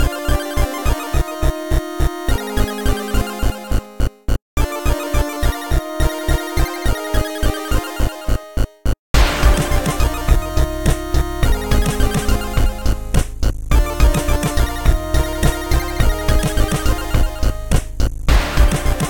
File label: Jazzcup Remix Instrumental; Friday Night Funkin' Vs. Maggie